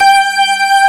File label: ORGAN G3; AKAI S6000 CD-ROM - Volume 1; SAMPLE WAVE MIXING 1